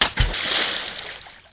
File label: splish; HereticII